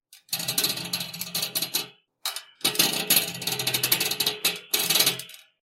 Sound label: vending restock start; Machines